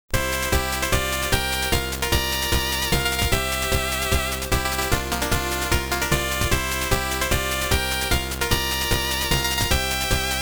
[VRC Chip]

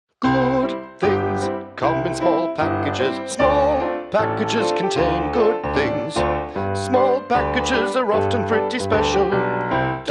Song Vocal; baron fur is gonna fly; switch windows xbox one; Bilby

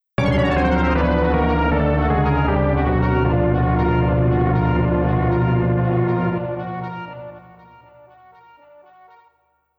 Defeated – jingle; The 7th Saga

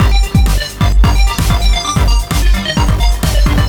Electro Charge

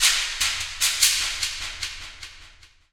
Shaker; Forest

